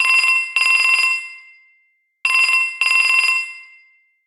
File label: glassy ring, ringtones; Google; Google Sounds; Play It Loud